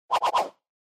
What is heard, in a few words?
Motion Swish Phrase; モーション スイング04-7(フレーズ)